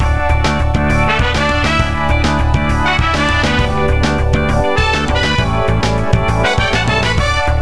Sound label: Beat; Wayzata Technology